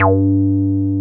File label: 303 RES G3F, RES BASS